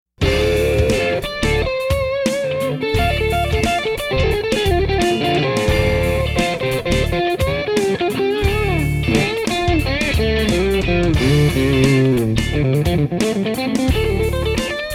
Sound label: Biyang NE5532 OD-8